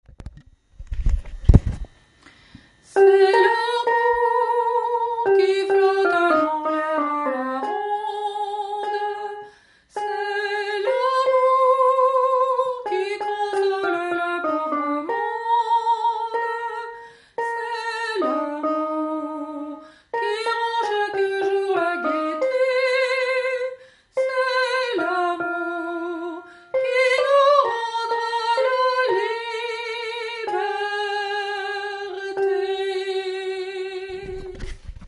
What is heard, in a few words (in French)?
chant refrain c'est l'amour